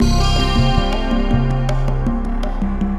ringtone, newage; Google; NewWave Labs

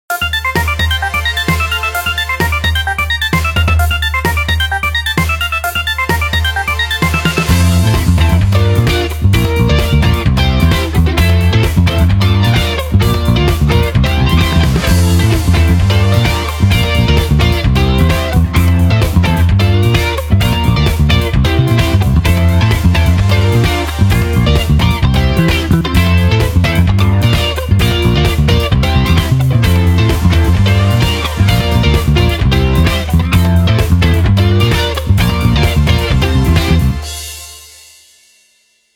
Arpeggios, digital; 500

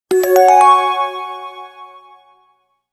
Alert Ascending; HTC T-Mobile Shadow

